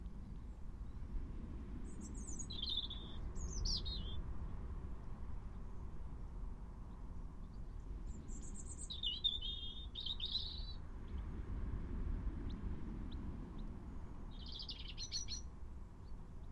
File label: European Robin Erithacus rubecula